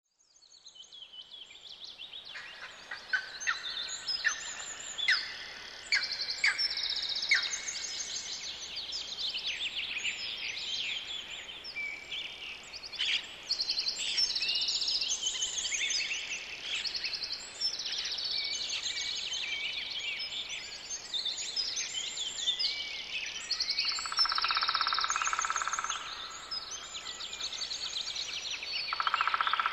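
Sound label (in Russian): zvuki leta, summer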